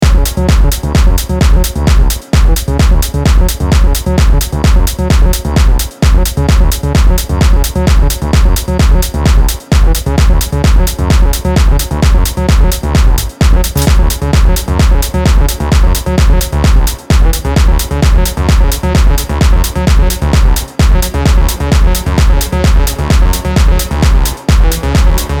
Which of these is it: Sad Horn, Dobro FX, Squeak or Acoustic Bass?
Sad Horn